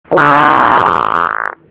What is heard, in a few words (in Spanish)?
Pedo